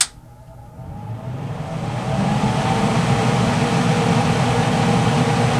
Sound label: vacuum, Motor Misc; SFX